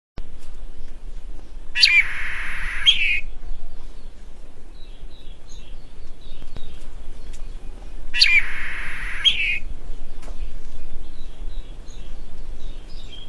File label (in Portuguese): canto do tentilhao vegetariano